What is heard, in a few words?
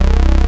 Bass Shot C; Mutekki Media; Vengeance Electroshock Vol.2; Bass Shots